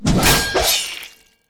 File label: Berserk Attack Sword; Legend of Keepers